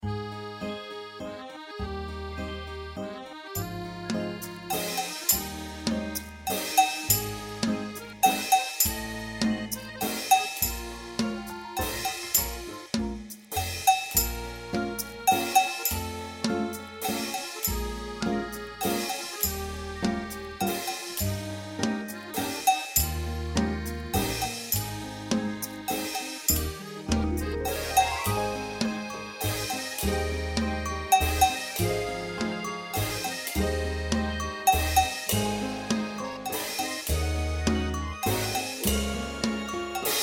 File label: 3/4 waltz